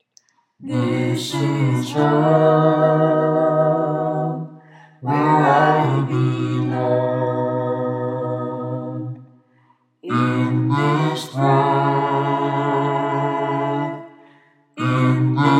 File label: bass, choir